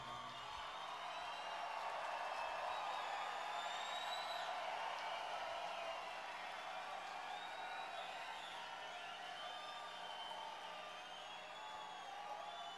Crowd; Erie, PA; Warner Theatre

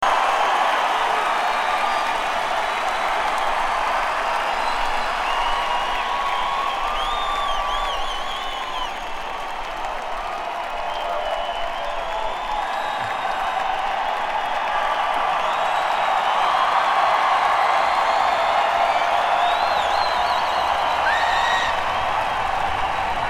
crowd

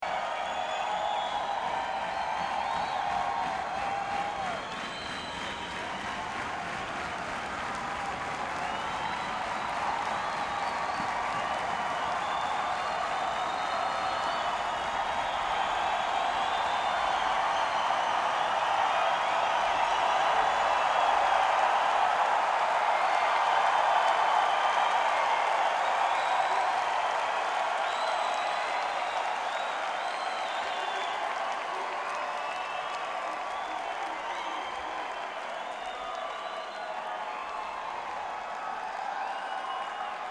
Crowd; University Park, PA; Recreation Hall, Penn State University

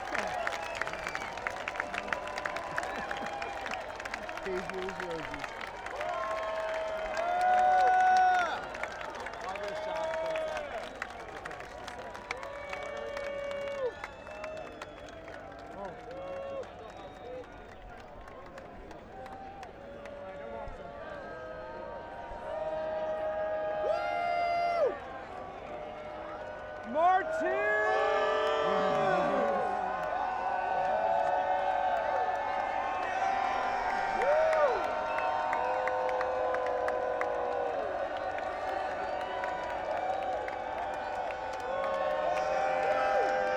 Crowd; New Orleans, LA - Jazz & Heritage Festival

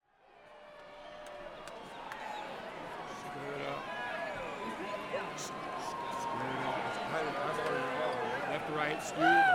Crowd; Grand Rapids, MI - Van Andel Arena

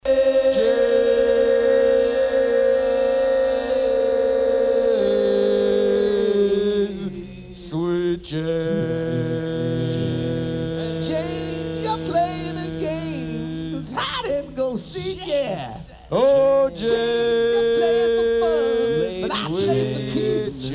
Vocal Jam; Soundchecks; sndchk

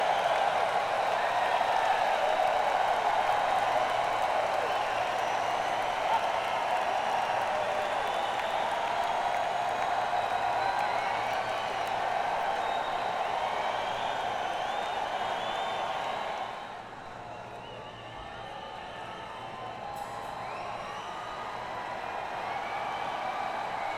crowd

